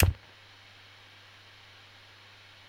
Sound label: AMP NOISE; 1000fx